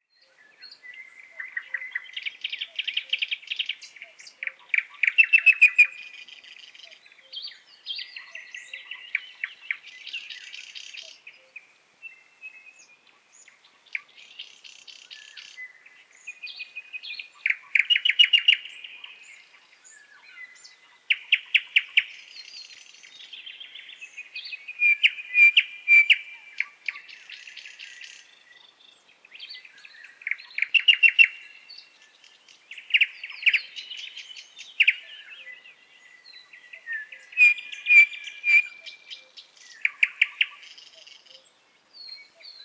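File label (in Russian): Zemlya Golosa ptic zvuki lesa nightingale; Zvuki Vselennoy; solovey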